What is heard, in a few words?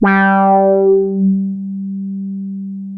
G4, Boom da Bass; E-MU Producer Series Vol. 6 – Analogia Project (CD 1)